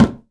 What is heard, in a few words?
EMELABO HITS; Best Service; World Colours